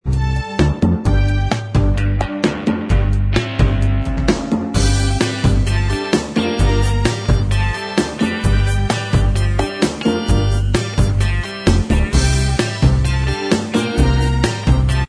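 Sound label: instrumental track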